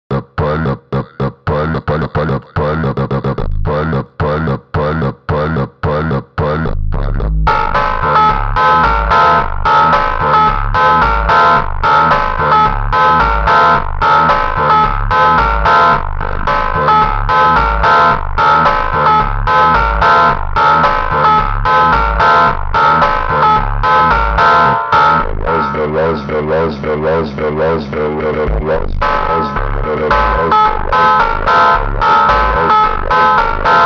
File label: Montagem super Slowed; فانک